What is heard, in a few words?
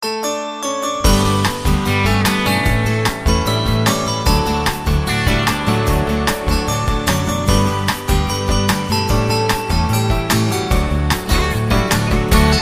ringtone